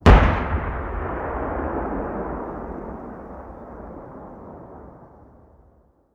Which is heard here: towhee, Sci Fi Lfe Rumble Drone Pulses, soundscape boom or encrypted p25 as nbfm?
soundscape boom